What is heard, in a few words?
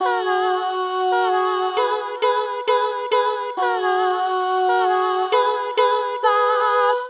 B Major 135 bpm, Harmonic Racks, Voice Box; MIDI Clips; Ableton; Factory Packs